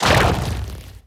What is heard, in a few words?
bounce; carrionqueen